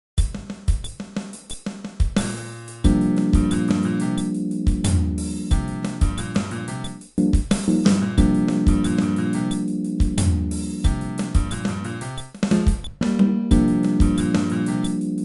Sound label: Groove, fretless bass